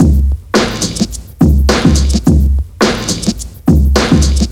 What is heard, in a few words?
LOOP; SoundFactory; DRUMLOOP